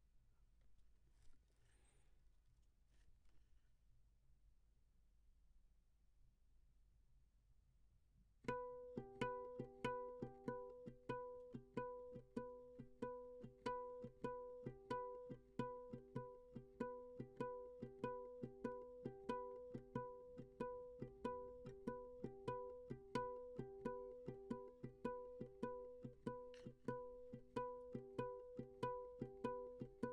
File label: Classic Guitar